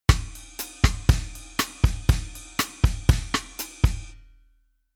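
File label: Rhythm Bossa Pattern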